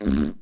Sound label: click